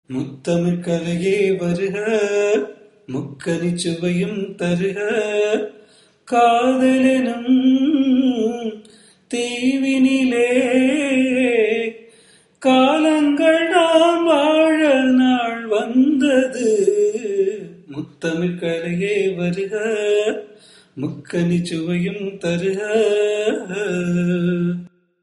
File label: raga gowri manohari cine; carnatic music